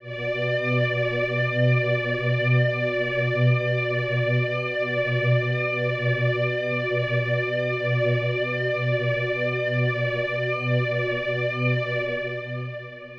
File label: One Shot Drone B, dystopian drone; One-Shot Drones